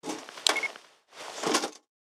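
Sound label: weapon mine attack; lunchbox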